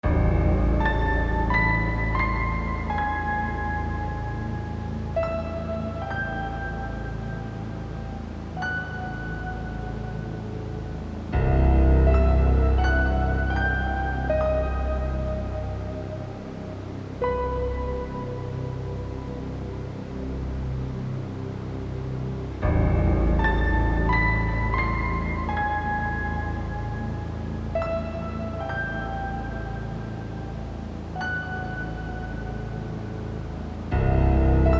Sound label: dark ambient; weeklybeats 2024